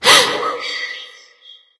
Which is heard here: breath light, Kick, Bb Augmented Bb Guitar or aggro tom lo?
breath light